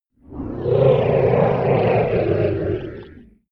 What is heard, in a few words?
roar calm